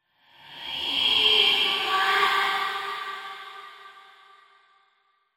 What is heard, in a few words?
Whisp Tense; horror and atmosphere fx; Silent Hill Downpour